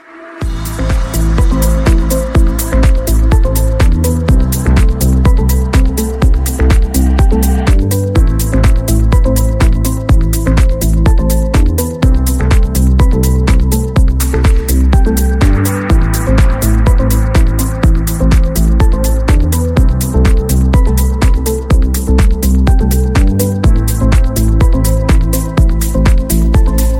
samsung galaxy SIII default ringtone

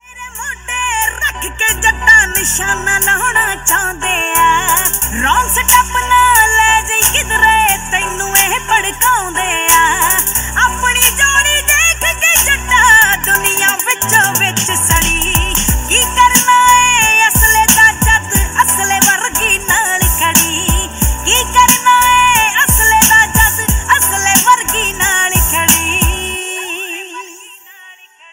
Cool Punjabi Ringtone

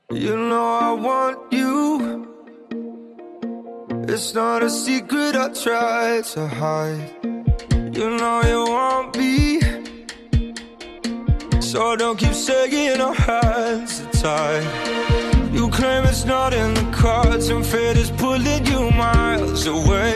ringtone

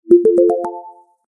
Oppo Realme X9 Sms Ringtone

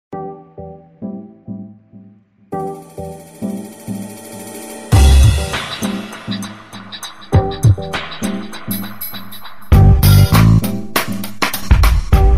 Samsung Galaxy View2 Ringtone

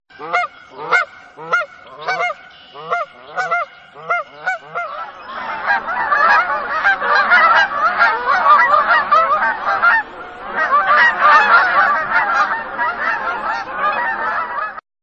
Canadian Geese Ringtone

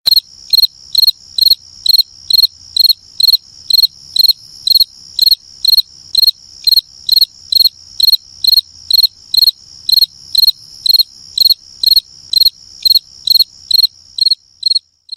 Cricket Birds Ringtone

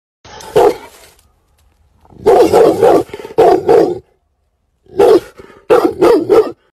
Sound of Cane Corso Ringtone

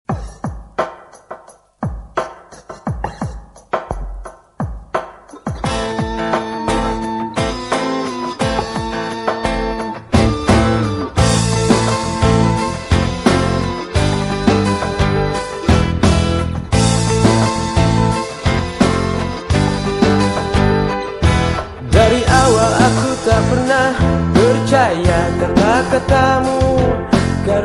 Arabic Style Ringtone

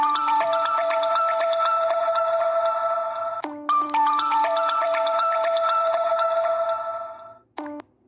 Huawei Flow Original Ringtone

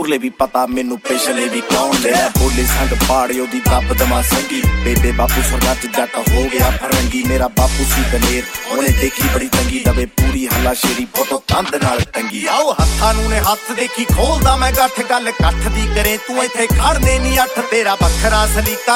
Latest Punjabi New Ringtone

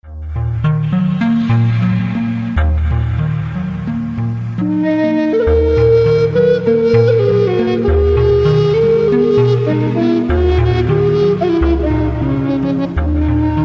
Relax Ringtone

